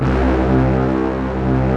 RICH BRASS; AKAI S6000 CD-ROM - Volume 1; BrassWind

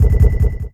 Subtle Kick; Sonic Foundry (Sony Creative Software); Esoterik Beatz; Subtle Kit

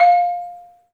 MARIMBA; 3D Sound; 3D Keys VOL-1

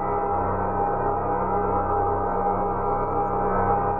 Tonal Re Draw, sparse soundscape

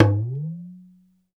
Talking Drum melo; E-MU Producer Series Vol. 7 – Old World Instruments

